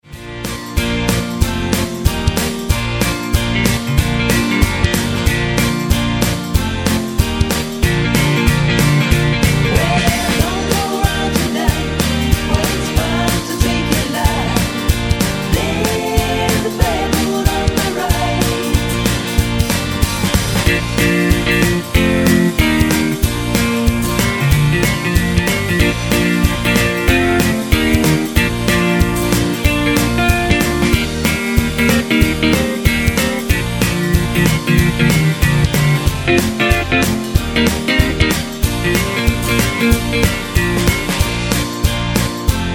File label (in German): mit Chor